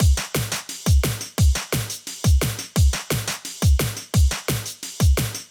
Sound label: DnB Fluff 174bpm